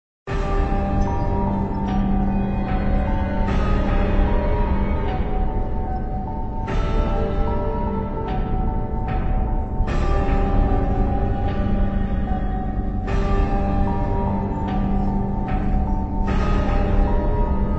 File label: Ambiance; Alice: Madness Returns unreleased original soundtrack